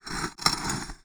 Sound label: fs Dishes Depart; netent